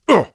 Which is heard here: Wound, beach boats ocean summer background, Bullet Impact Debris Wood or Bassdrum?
Wound